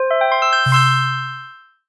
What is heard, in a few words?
big win